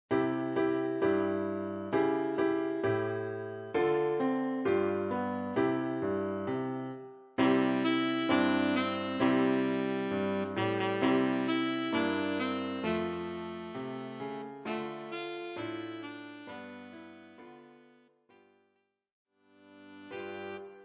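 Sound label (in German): Altsaxophon; Jingle Bells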